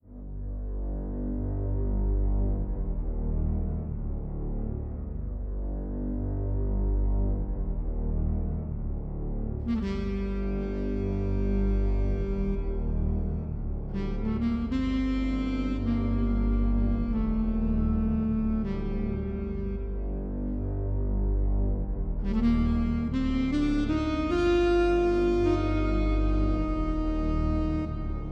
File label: Ambience Jazz